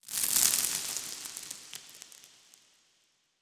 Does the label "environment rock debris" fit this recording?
Yes